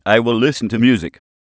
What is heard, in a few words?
music; 2d animation; lipsync